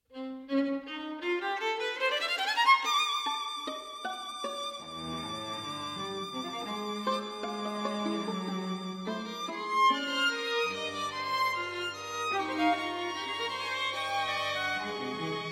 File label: String Quartet